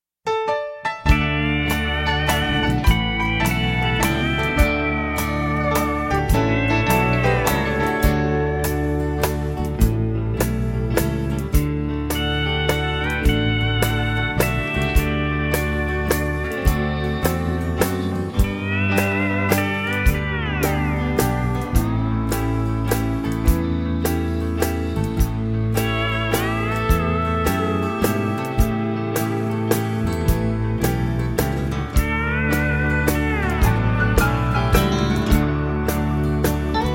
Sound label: TV Lead Guitar Up; CSP Records